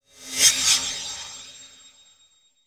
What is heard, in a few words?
Flash Frame, Title Sequence Effects